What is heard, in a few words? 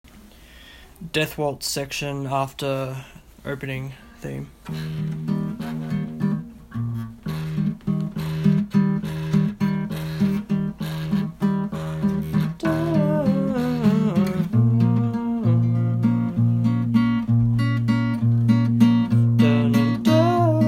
Death waltz after opening